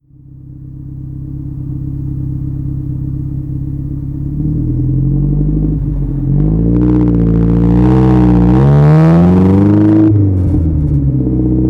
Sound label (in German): alfa romeo spider weber doppelvergaser landstrasse